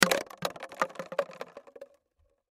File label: Paper Towel Tube, Paper Towel Tube Empty Drop Bounce; Drops; Household; Objects; Blastwave